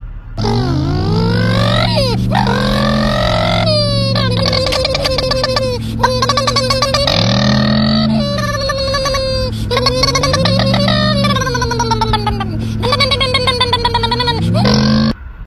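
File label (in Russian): sukhoy vydokh antilopy pri okhotnichey zasade; zvuki antilopyi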